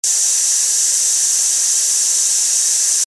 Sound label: noise zzz